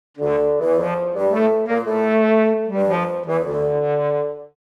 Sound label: Game over you lose fun playful